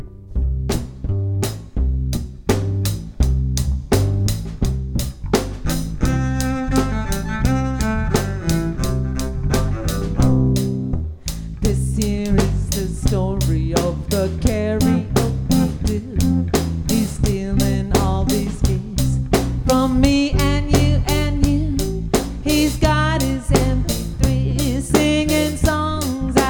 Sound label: karaoke singer